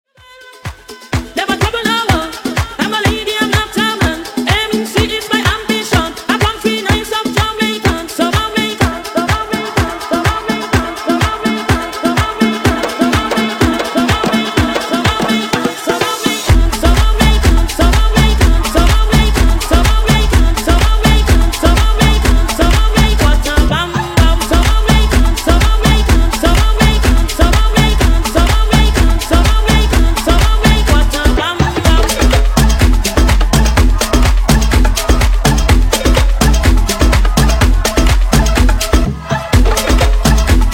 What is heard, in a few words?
Jamaican Funk Dj Intro Outro 125bpm; remixplanet